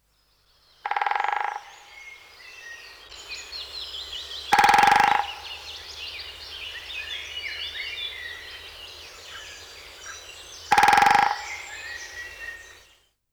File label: Great Spotted Woodpecker drum